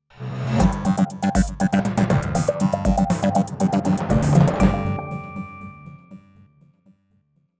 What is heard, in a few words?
jingle info